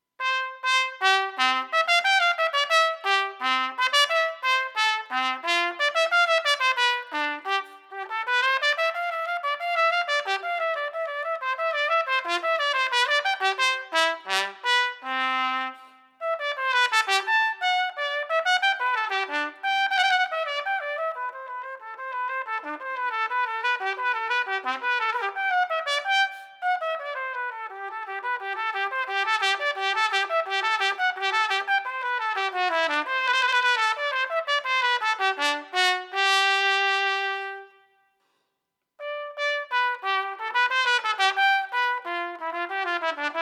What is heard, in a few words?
Cello Suite Courante, bach; Trumpet; #1, BWV 1007